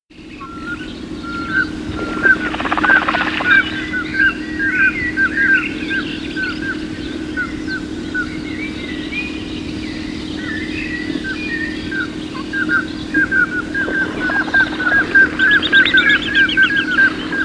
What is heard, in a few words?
Common Scoter, birdsong